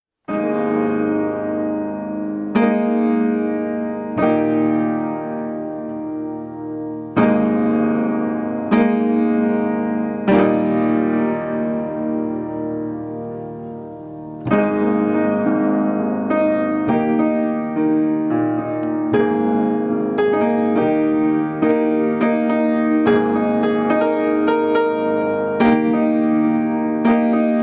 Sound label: Progression slow; Piano; adagio